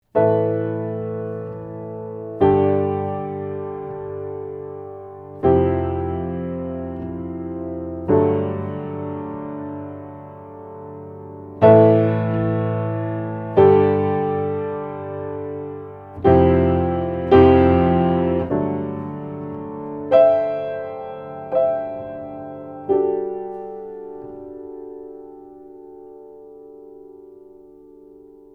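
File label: Piano, vintage AKG C414 COMB condenser; SHAFER BABY GRAND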